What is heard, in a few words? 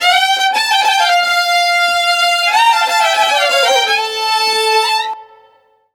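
Balkanish Traditions strings; USB Soundscan vol.63; AKAI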